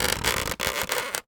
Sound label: Polystyrene Creak